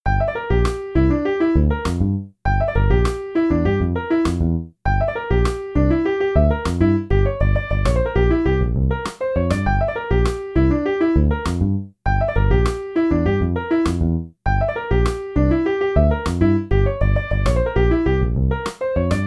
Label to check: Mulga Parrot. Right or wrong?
Wrong